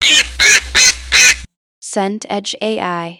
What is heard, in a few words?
duck quack